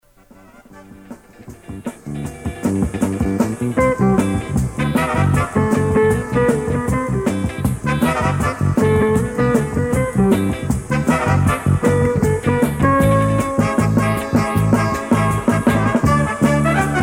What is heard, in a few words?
Music Bed